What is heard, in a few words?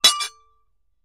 Axe Clinks, Dull; Light Fast Metal Clank Of Axes Scraping Together. - Metal Scrape